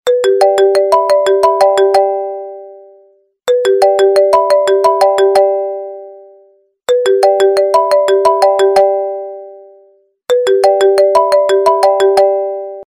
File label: ringtone; 3CXPhone